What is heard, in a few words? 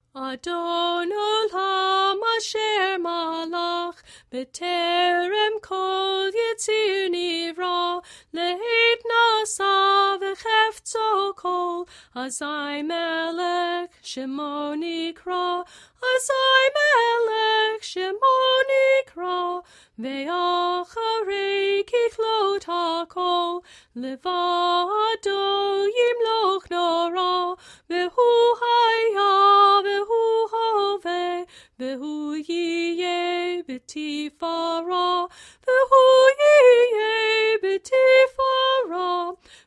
Adon Olam Chanted; Cantor; concluding service